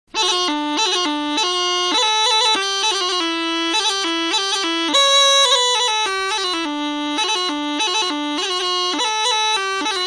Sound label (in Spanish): turuta